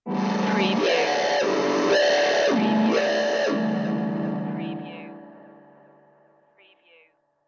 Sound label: swamp swagger stinger